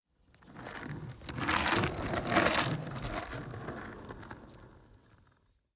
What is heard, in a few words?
skateboard kick push